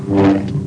swing; saber

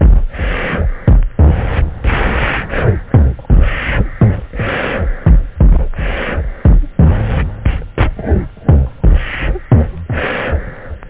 BEATBOX, LOOPZ; PhatTrax

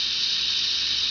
airhose; ambience; X-men

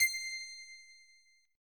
ping

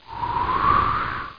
wind

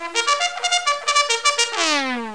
loser

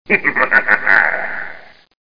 snicker; X-men

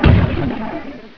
Letter Wall Hit; Technomaniac 1.1

